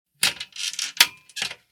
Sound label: indoor Lever; Keepsake